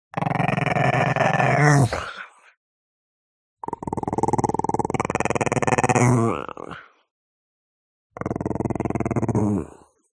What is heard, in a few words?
DOG Chihuahua Growl Strained; ANIMAL; smartsound